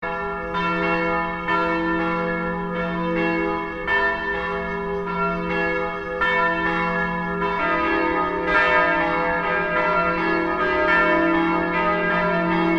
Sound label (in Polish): dzwony koscielne